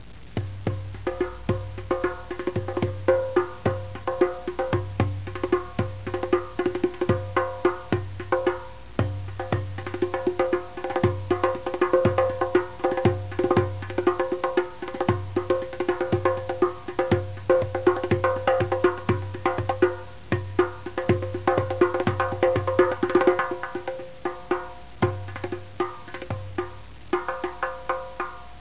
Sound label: drum